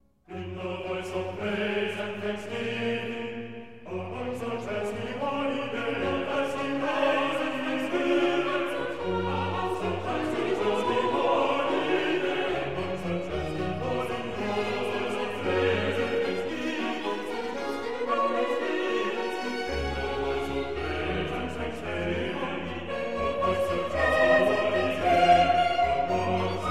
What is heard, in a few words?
Anthem; Chandos Anthem 6